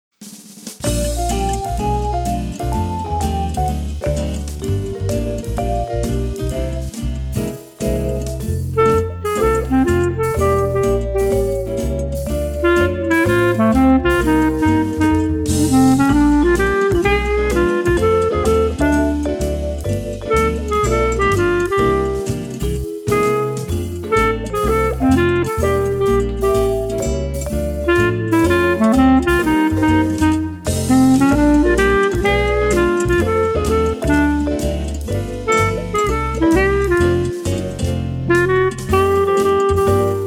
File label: clarinet performance; Level: Intermediate